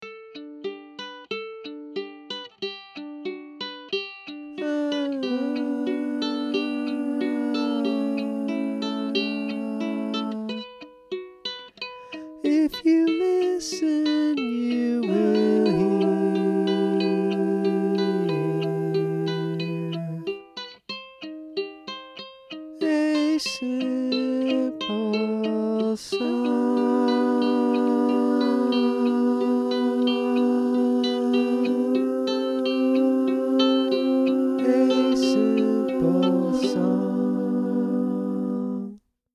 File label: Simple Song